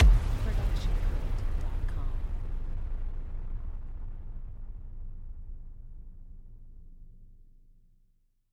Cinematic Hit

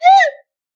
slide whistle single note

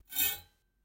sword scrape; soundscrate

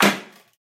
Metal Hit; soundscrate

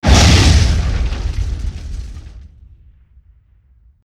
Sci Fi Explosion; SoundsCrate

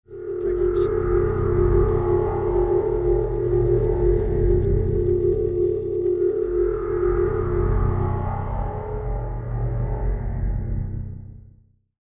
SciFi Machinery; SoundsCrate

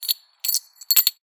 coins handling; soundscrate

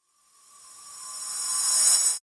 fairy spell reverse; soundscrate

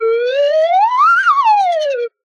slide whistle crescendo vibrato

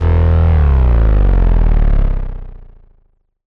synth fall; soundscrate

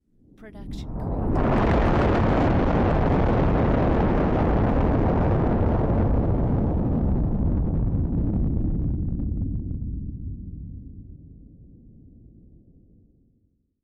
Nuclear Explosion Distorted; SoundsCrate

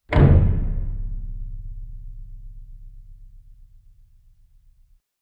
Horror Door Closing; SoundsCrate

